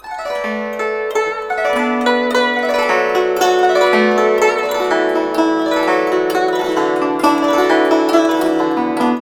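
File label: guzheng; Spectrasonics; Heart of Asia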